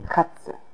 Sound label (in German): katze